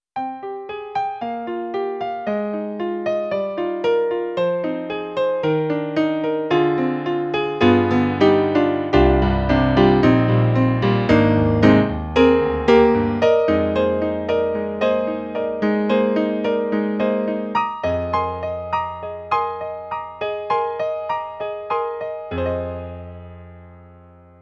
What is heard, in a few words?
Jazzy Grand; E-MU; Sounds of the ZR Expansion ROM